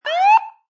Police Car Siren Short Yelp City Perspective; Emergency Vehicle Sound Effects; Lynne Music

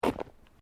Footsteps Boots Road Step

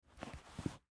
Textbook Pick Up, Textbook Pick Up Carpet; Foley; Book Sound Effects; Offices

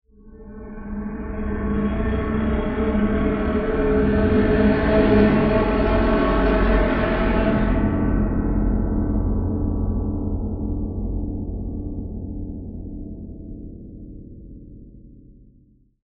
Low Horror String Transition; Scary Soundscape Sound Effects; Scary Soundscapes (Sound Effects:Horror)

